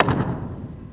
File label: Chisel